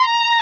violin

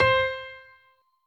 piano

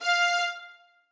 string

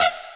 synth

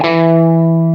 Guitar; 轻音少女

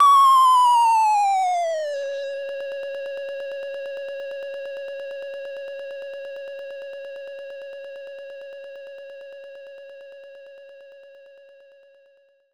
SE RESULT GAUGE DOWN; maimai

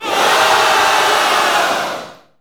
SE GAME BREAK; maimai

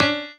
piano melo

